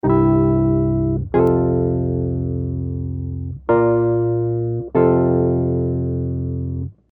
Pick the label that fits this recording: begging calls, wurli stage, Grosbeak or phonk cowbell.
wurli stage